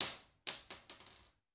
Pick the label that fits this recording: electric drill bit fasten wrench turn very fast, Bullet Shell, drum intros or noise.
Bullet Shell